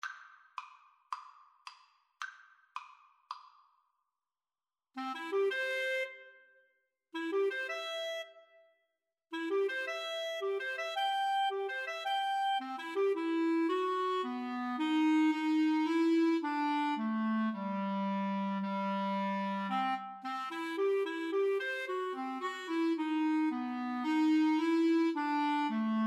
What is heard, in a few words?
clarinet duet; mendelssohn